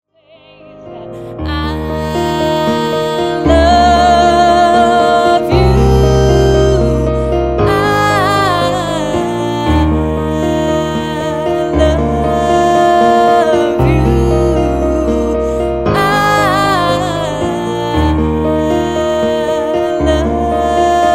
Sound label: Piano